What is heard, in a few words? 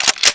cock, weapons mossberg